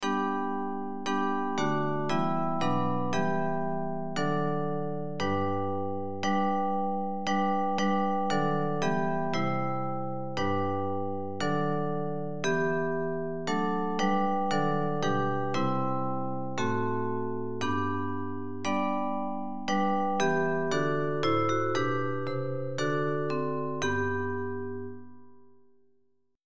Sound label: Bells; Hymns